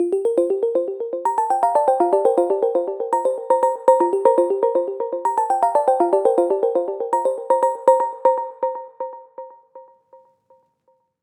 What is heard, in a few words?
Melody Loop 120BPM Gm